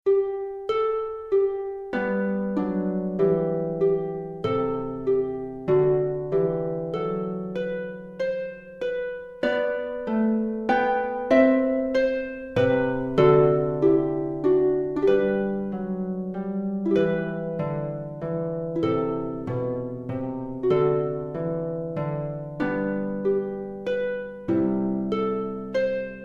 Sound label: Harp